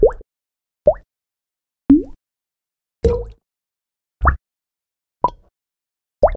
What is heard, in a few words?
waterdrops; best service Blue Box; water fx